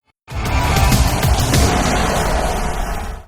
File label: guitar slam